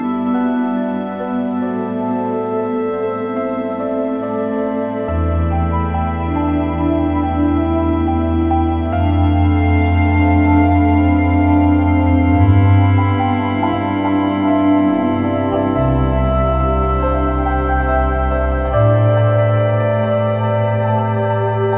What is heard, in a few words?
Exploration, music; rpg